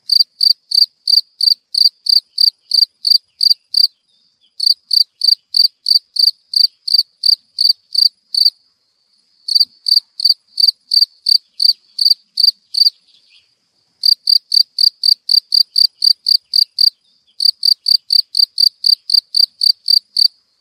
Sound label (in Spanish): tono grillos